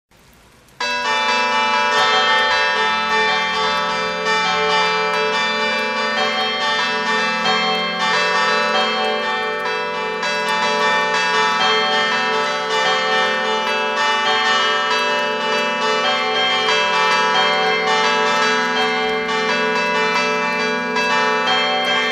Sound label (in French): cloches carillon